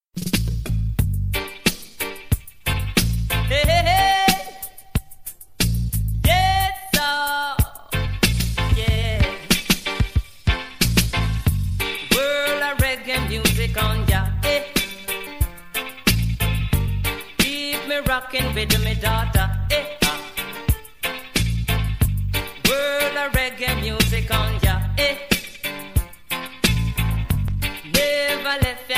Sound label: ragga beat